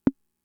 Conga, Fricke MFB-522

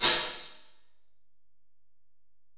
909 PHH, 480L; Wizoo - Platinum 24 Electronic; 480L SP 909

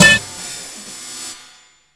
METAL FACTOR; WORS STATION; BODY BEAT

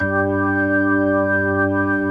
ROCK ORGAN

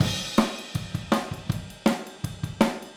Overhead ST, Drums, 162 BPM; Drums from the Big Room The Mixes; Take Your Toms Off; Chorus 01

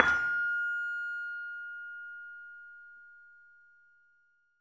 LAMEL F5, Asian Traditions; USB Soundscan vol.60; AKAI; LAMELOFO

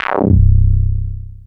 Basses, C; Total Drum&Bass

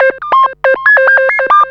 Analoop, Industrial

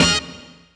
horn hit, Hardcore Breakbeat; Big Fish Audio; Hardcore Breakbeat Action

